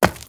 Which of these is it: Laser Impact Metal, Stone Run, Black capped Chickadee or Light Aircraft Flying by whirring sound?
Stone Run